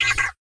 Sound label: rocket det